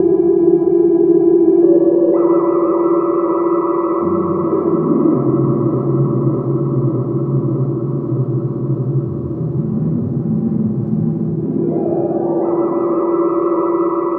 odd e, Weird Loops, Chillout; Best Service ProSamples vol.36 - Chillout

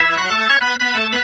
Organ Lick; Ambient Grooves; Organs Licks

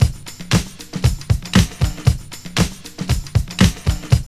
DISCO LOOP; Zero-G; Funky Elements

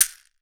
CAXAII, Definitive Percussion

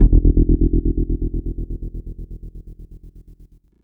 bass chopper, Drum N Bass; Sonic Foundry (Sony Creative Software); Knutrix Drum & Bass

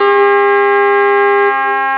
trumpets; cadaver; Amiga Soundtracker Sample Packs

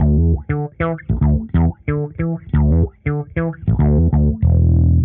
Bass 95bpm, E, dusty funk

